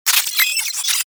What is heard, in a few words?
space drone pain; Warframe; Corpus Space Drone